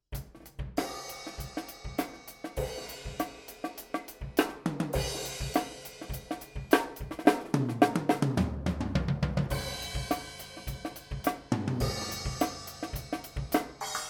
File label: XY drums